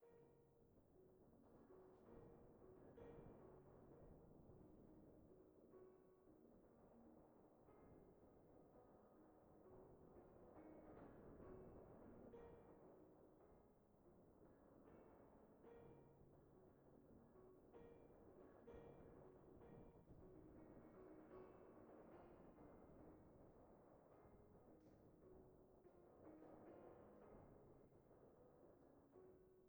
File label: Impromptu Noise; Schubert